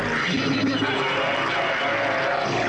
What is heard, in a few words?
laugh